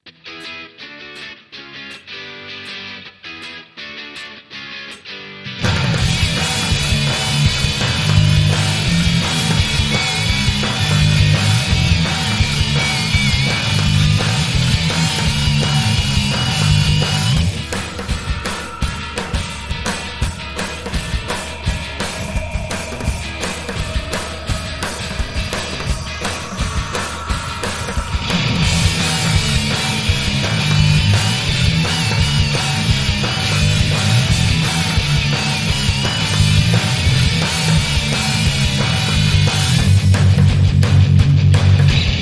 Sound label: Instrumental